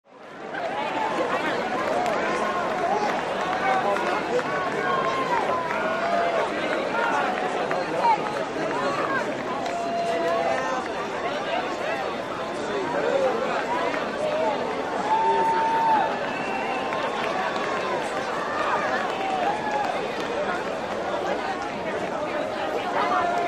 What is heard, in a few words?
Sports Crowd Idle, murmurs various